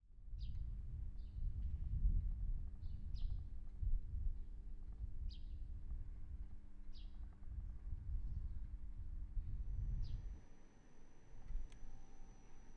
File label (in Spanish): Aeropuerto